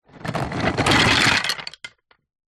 leisure toy train wooden track pieces in plastic box tip out